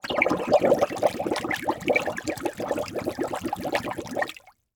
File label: Underwater Water Flute Under Water